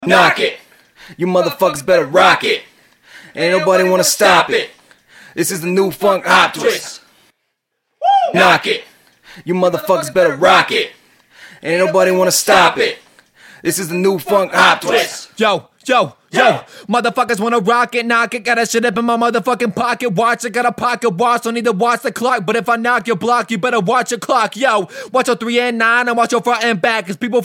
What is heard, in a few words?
acapella, 116 Bm